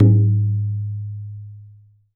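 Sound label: BANYA left; AKAI S6000 CD-ROM; WorldSounds; TABLA+BANYA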